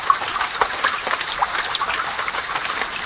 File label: water loop; 摇钱树; ITEMS